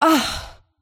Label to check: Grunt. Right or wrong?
Right